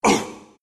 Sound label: pain; leshrac